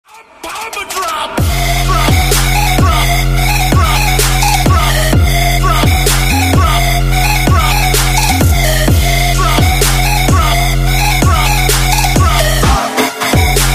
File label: bomb trap